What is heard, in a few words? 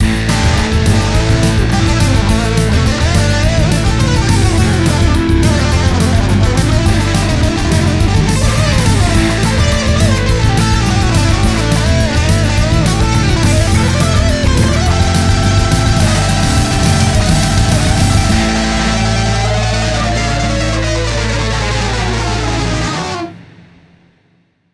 cinematics passage unloop; Hard Truck Apocalypse; Ex Machina